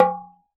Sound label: oxaca, percussion; ILIO; Synclavier Percussion 2; World & Orchestral